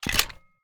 zipline mount; rust

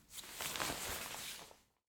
cloth Remove; spellbound

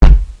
ball hit

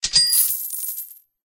add money; idle mining empire

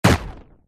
explosion cluster

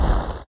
Snow step

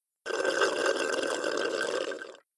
gulp; plumber soda

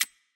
Empty Barrel

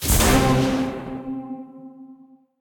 win Small; jumanji

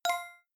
correct

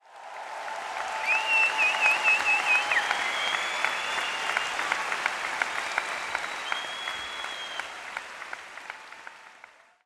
crowd Chatter arena; spellbound

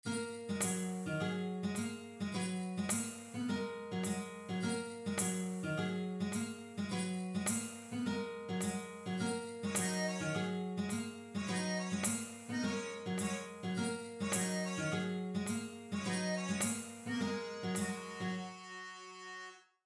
arena background music

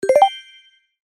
cash out short

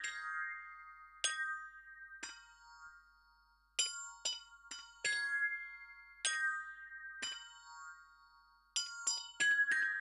MELODICS, viral hiphop

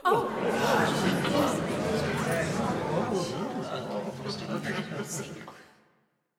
crowd Reaction shrine big Reveal; spellbound

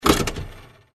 start reel

